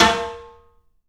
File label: PA snare; E-MU Producer Series Vol. 5 – 3-D Audio Collection; 3D Percussives